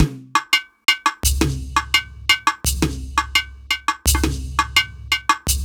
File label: Mixed Percussion, percussion loops; KJ Sawka; Drum n Bass & Beyond; Drum Tool Box